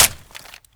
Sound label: aug bolt slap, weapons; fnscarh; garrysmod